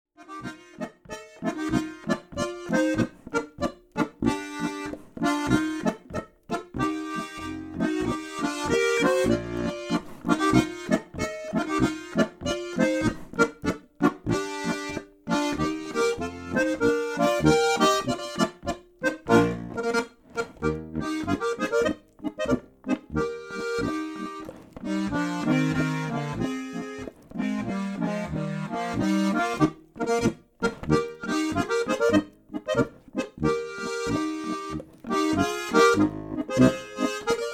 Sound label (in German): scheene Zeit, Volksmusik